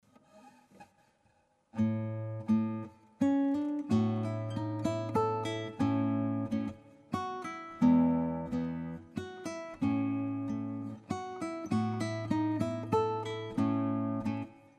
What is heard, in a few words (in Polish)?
walczyk, gitara